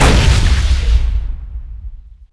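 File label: tank attack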